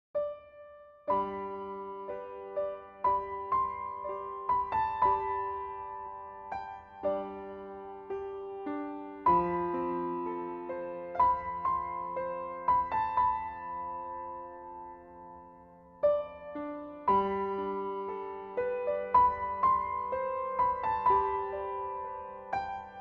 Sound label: Touching moments melody, Classical